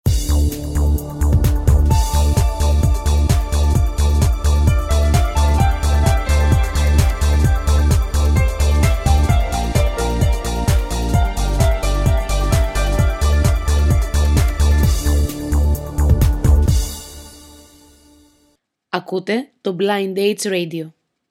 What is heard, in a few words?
jingle